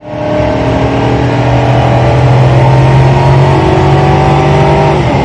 no shift, vehicles; cvpi

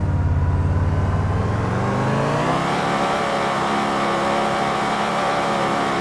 first gear reverse, vehicles; premier

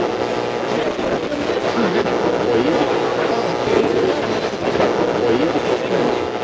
radio static loop; vehicle radio

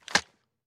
reload empty fast end; gmod; viper

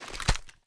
reload fast end; reloads; gmod; iw8

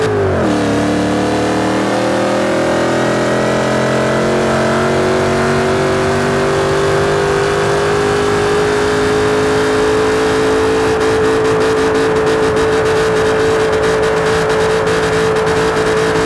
third, vehicles; 15charger

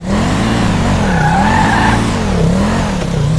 first, vehicles; gmod; tdmcars; gtav; pol cruiser

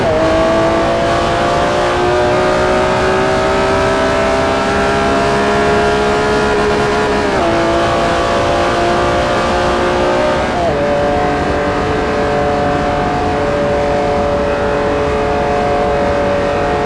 fourth cruise, vehicles; gmod; tdmcars; enzo

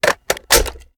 reload mag in

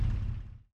stop, vehicles

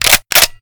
ar reload empty chamber, weapons; viper

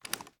sm uzulu reload empty xmag rattle; reloads; iw8; gmod

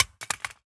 phys mag drop smg poly concrete, magazine drops

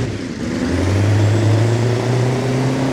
second, vehicles; bus

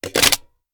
reload mag in; gmod

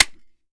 reload mag in

